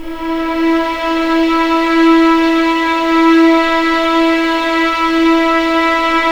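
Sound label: E4 legato violin; East West; The Ultimate String Collection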